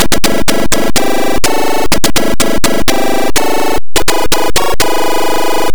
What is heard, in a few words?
Fanfare; Commando. Fanfare (C64); CNGSoft